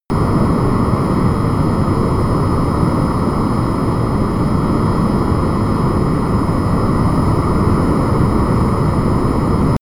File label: plane honda private small turbine steady distant